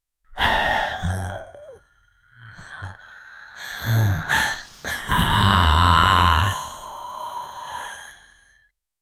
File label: a low pitched guttural fe, vocals